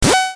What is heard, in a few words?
retro; maoudamashii